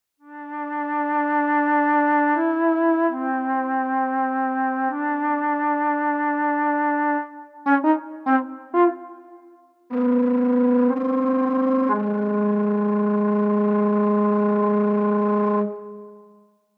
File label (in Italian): FLAUTO ALTO